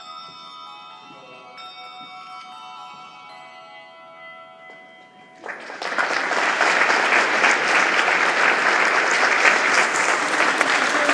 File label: bells ringing sensor operated 8 churches in leeds